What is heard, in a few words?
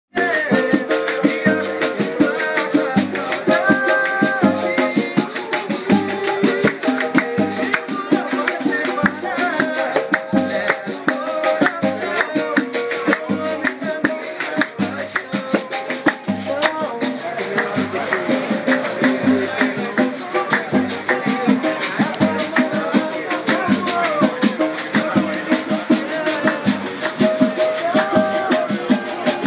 the sound of the pagode band copacabana beach; 5live